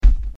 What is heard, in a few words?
thud